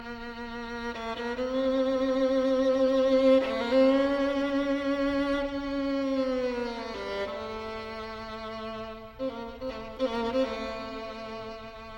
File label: creepy violin; Electronica